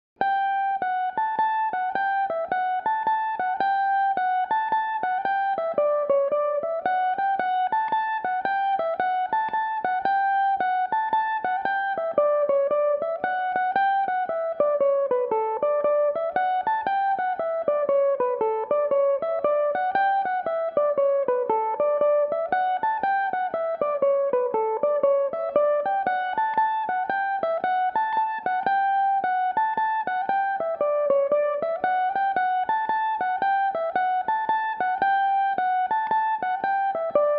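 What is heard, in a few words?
d major, mandolin; The Beesom; Belmuse EM-190 electric mandolin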